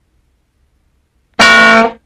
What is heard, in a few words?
bonk